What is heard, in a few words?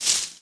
shrub hit